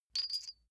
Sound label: small metal handling jingle single; industrie wirtschaft; metall